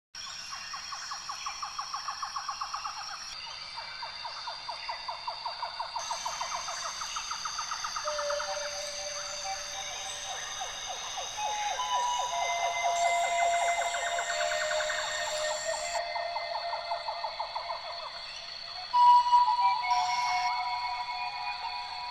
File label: Rain Jungle Sax and Okarina; ComicArtMusic